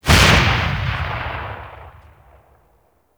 Shot; Warframe; DS Anniversary; Bronco Spectre

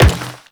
Step Metal, Footsteps

